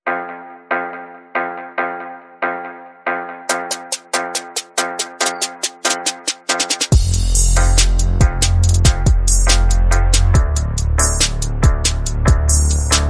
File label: Bass and Perc